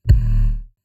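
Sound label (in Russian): грязные колодки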